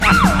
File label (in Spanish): grito ahh